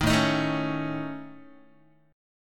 A Major Flat 5th, Guitar, AMb5 chord; strummed; JGuitar

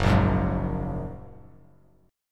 F sharp Major Add 9th F sharp; strummed; 2,1,4,1

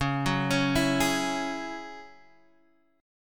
F sharp 7th Suspended 2nd C sharp

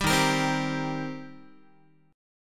D sharp 6th, D sharp, down, Guitar; strummed; 10,11,13,10,11,x; JGuitar

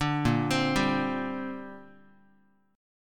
A sharp 6th Add 9th, Guitar; A#6add9 arpeggiated; JGuitar

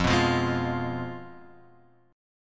F Diminished, guitar chord; Fdim Chord {1 2 3 1 X 1} strummed

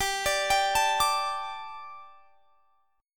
G Suspended 2nd; arpeggiated

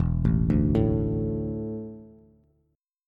E 7th Suspended 4th, Bass; E7sus4 arpeggiated; JGuitar

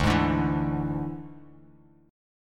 D Suspended 2nd Flat 5th D down Bass Standard; strummed

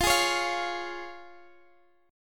F Minor Sharp 5th, F, Guitar, Open G; x,x,15,13,14,15

